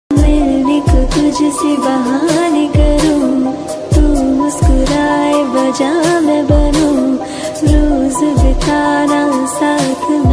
Ringtone Of Romantic Song In Hindi

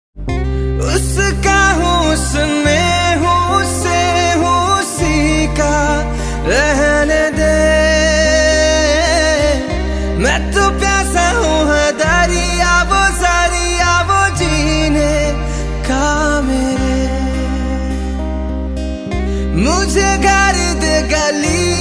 Love Song Ringtone

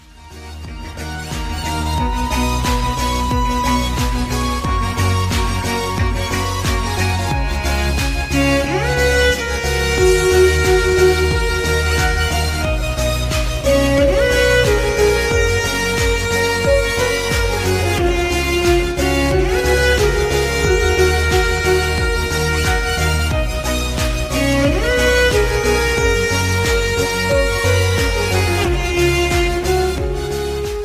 Ringtone Instrumental

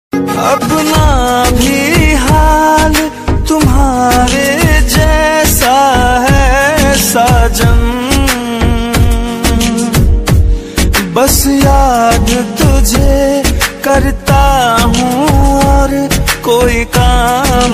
Love Song

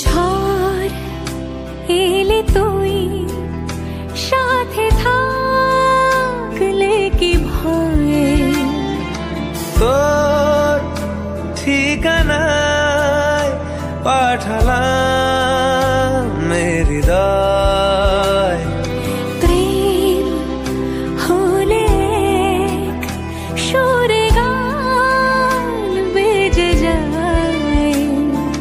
Bengali Song Ringtone

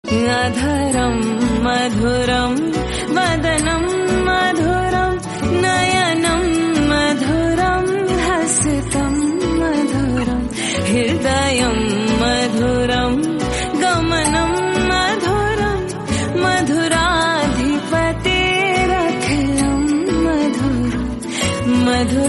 Hindi Bhajan Ringtone

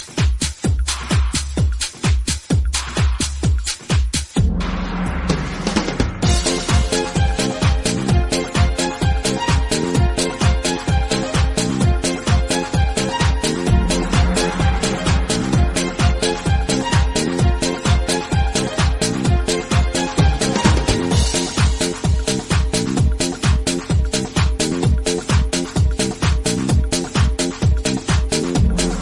Bellydance bgm ringtone; ringtones

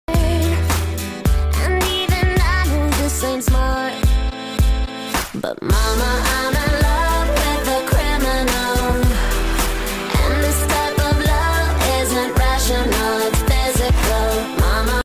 Song Ringtone

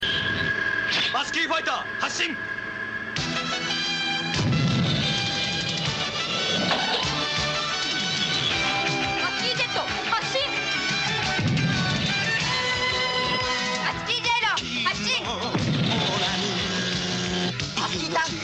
Horror Effect Ringtone; ringtones

